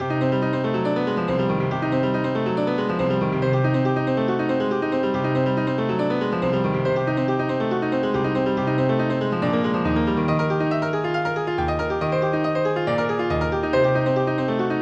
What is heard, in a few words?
Etude in C minor for piano; Haarlem